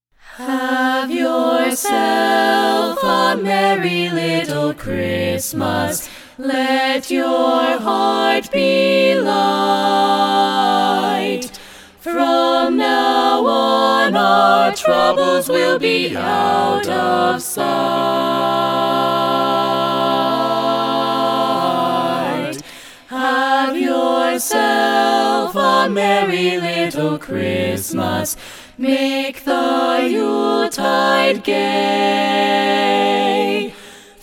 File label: Carolers; Denver